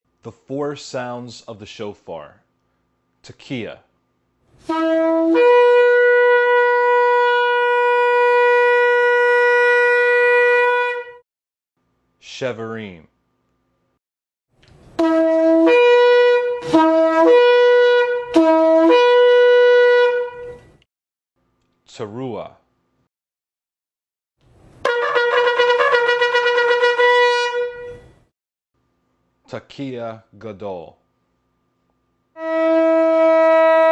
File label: Sounds of the Shofar